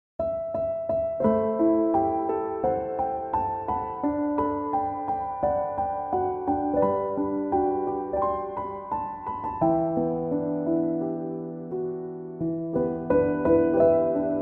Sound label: chill piano instrumental